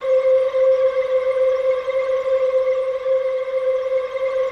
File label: PAD, Yamaha VP-1; Music Store; JODY